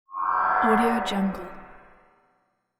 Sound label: transporter warble whoosh; hollywoodedge